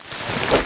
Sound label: phone; florent; grey